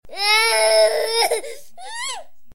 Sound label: cry; CosmicRage